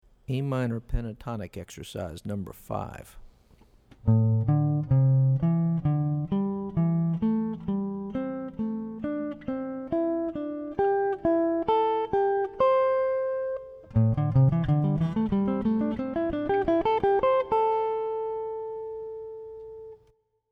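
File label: Am Pentatonic Exercise, guitar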